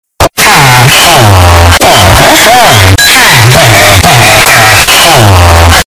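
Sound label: villager but bass boosted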